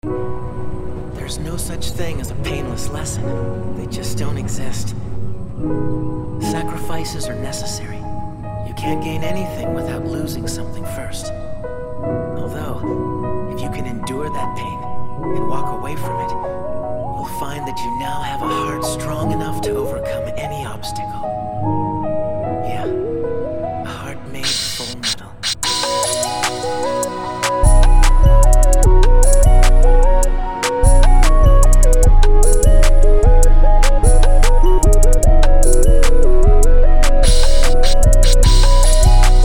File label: plugg loop